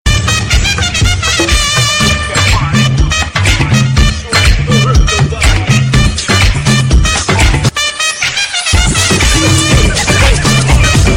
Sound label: Jazz; Ipl 4 New Jazz 2011